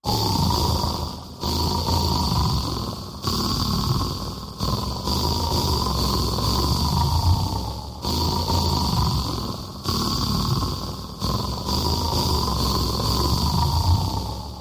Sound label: Operatic Washer Machine, Washing; Voice, Rhythmic, Ambient, Electric; Musical Speech